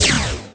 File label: small laser fire; weapon